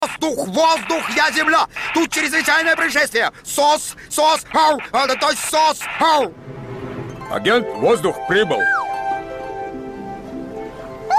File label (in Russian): Muzyka Dlya Multfilm